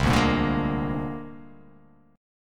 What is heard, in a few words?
C 7th Suspended 2nd Sharp 5th, C7sus2#5 chord; C7sus2#5 strummed; JGuitar